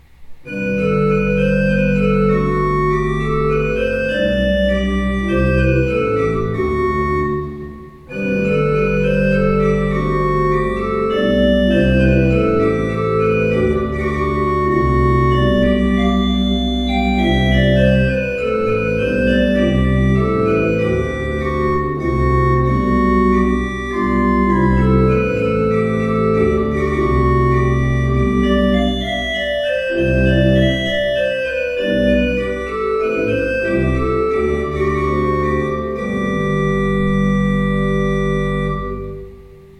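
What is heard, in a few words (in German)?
Kleuker 15 II P, pfeifenorgeln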